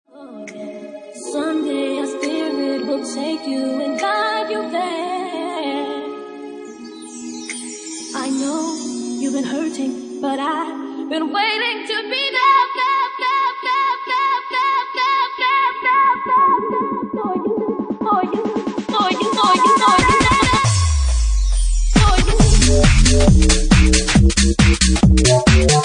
Bassline; Bassline Meltdown Session 1

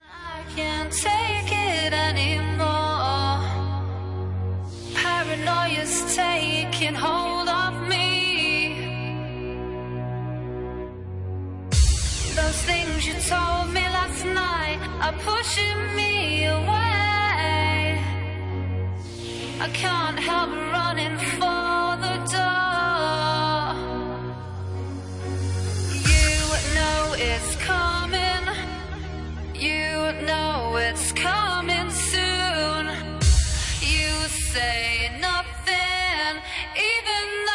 Chunky Bline Remix

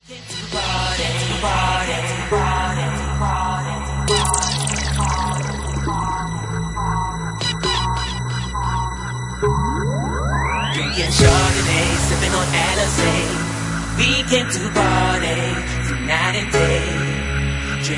Organ

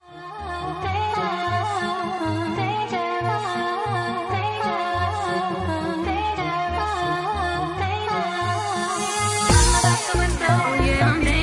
Organ 2 Bass Mix

